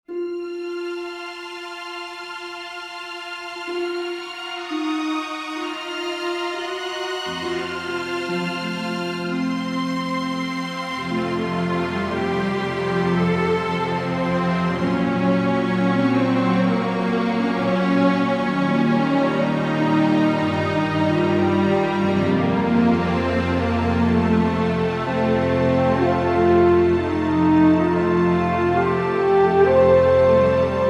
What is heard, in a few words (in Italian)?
descant mix